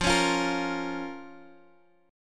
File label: F Suspended 4th Sharp 5th F